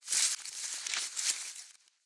ruffled leaves

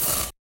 lightsword hits; soundscrate

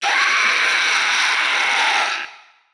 raptor screech; soundscrate

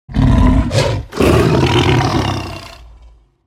tiger roar; soundscrate

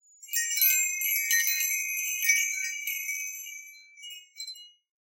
Wind Chimes B

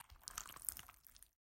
squishy short; soundscrate

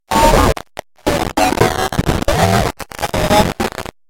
computer glitch

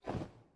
cloth drop; soundscrate

